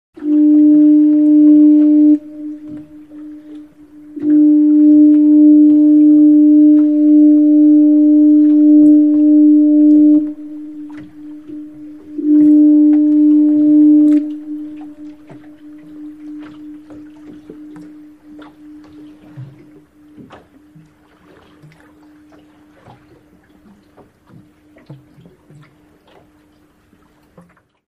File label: Ship Foghorn Wat Lap; fog horns